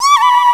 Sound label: YHU, FAIRLIGHT CMI IIX, CHORAL